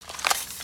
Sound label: idle rattle; creatures organic